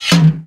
case out

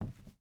footstep hide wood walk; Rust

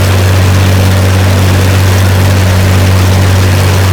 Aircrafts Engines Packard Merlin Prop; DCS

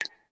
city occasional

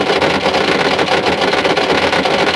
engine damaged; World of Tanks Blitz

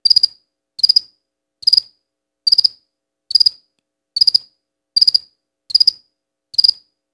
bug cricket loop; Call of Duty Modern Warfare

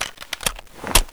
Inf Weapons Foley 3rd; Red Orchestra 41-45

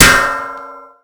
hit; Rust

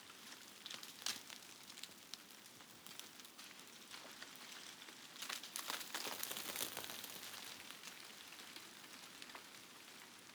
ambient; World of Tanks

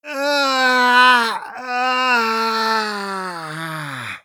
agony; Escape from Tarkov; scav6

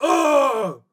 flinch hard; Rust

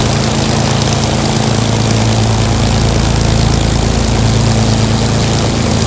spitfire side, engines; War Thunder

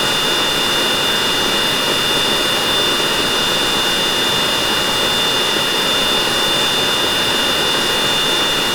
Aircrafts L-39 AI25 RPM1; DCS

